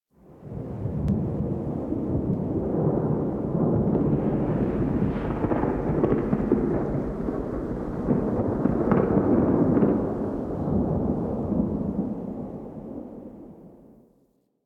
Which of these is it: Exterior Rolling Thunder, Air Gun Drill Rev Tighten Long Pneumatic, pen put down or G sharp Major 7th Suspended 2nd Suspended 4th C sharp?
Exterior Rolling Thunder